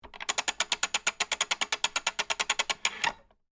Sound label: Phonograph Crank, Hand, Crank, Turn, Machine; Technology; 1895, Berliner, Trademark; BlastWave